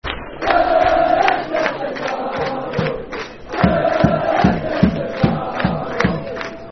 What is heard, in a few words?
Football Chant, Darlington; Der De De De Darlo; Darlington Dfc